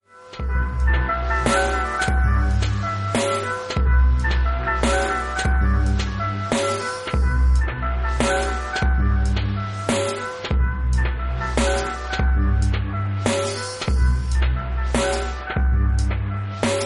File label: AMBIENT EXPERIMENTAL